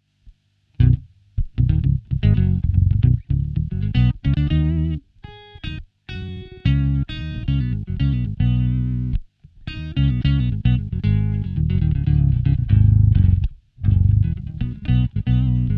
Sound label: octaver; behringer pedales guitare